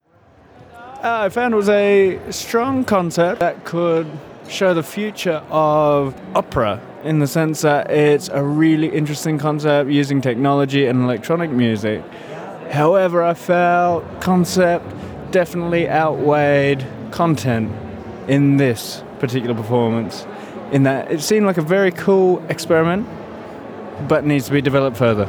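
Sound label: Stimme male English opera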